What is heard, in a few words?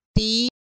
tone